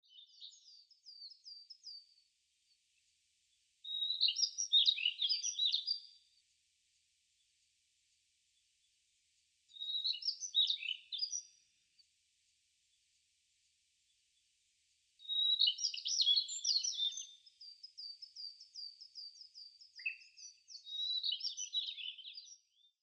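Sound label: jobitaki, Daurian Redstart; shiga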